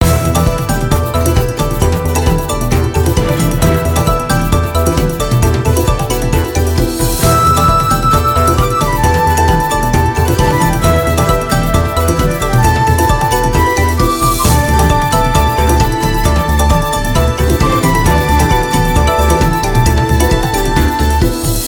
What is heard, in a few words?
free spin melody; Grand Tiger